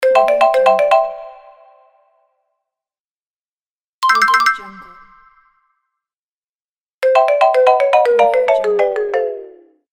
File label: happy moment